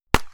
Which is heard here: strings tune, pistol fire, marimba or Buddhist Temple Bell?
pistol fire